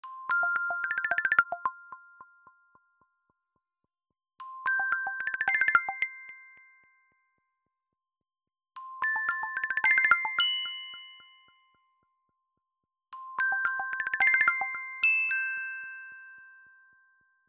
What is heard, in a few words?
Nano Ringtone; iOS Sounds